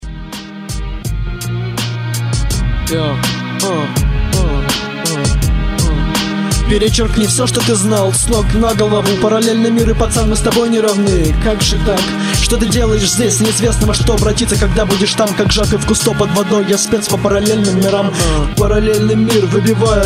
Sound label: drum